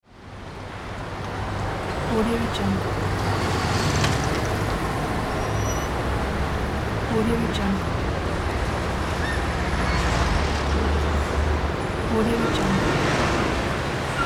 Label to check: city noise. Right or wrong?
Right